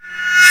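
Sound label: BELL reverse, percussion kit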